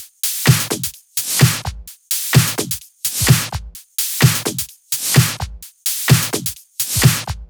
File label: 128BPM The Big Groove Kit; Vengeance Samples